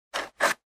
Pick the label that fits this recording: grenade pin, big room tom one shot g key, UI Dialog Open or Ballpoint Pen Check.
Ballpoint Pen Check